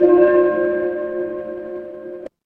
instrument singing bowl

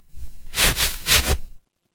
paper fabric fabric

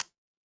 ui tick

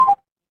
ui call end

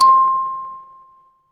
instrument kalimba

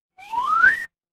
cartoon slide whistle

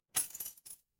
glass shatter

